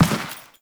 Step Dirt, Footsteps; Warframe; Teshin

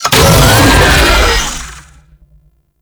Infested Vocal Pain; Warframe

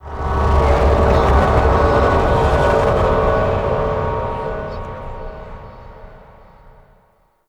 Quest Moon Reveal Sting; Ambience; Gameplay; Warframe; Orokin Moon

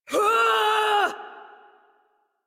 Exertion Adult Operator; Warframe

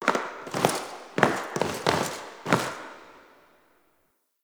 Grineer Steps Distant Interior; Warframe; Tutorial; Cinematics

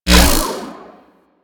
Kick Punch Whoosh; Warframe; Weapons; Corpus

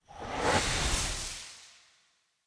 Soul Steal Combined

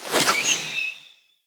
Hunter Bow Ranged Arrow Projectile Start; Weapons; Player; Bows; EnvoyHunterBowRangedMelee

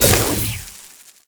Tentacle Proj Die; Warframe; Proto Infested; Ancient; Abilities

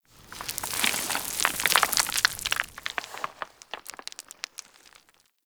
Settlement Sand One Off; Warframe; Grineer Settlement

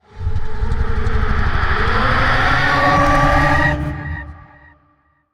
Vocal Idle; Warframe; Zariman; Void Angel

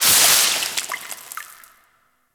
Ocean Door Open Drips; Warframe; Grineer Ocean

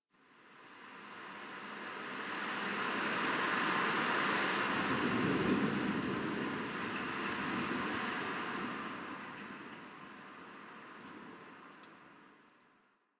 Exterior Winter Wind One Off; Warframe; Ambience; NineteenNinetyNine

